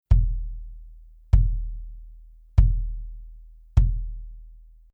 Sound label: BASS Reso Soft Felt; evans; naciag do centrali rezonujacy